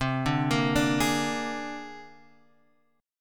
C 7th Suspended 2nd Sharp 5th, C, down, Guitar, Open G